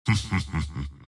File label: wraith laugh; dota; skeleton king